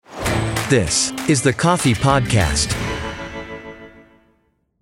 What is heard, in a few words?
Jingle